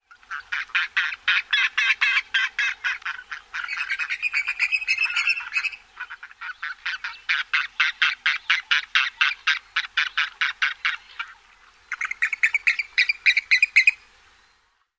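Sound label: Clark's Grebe other calls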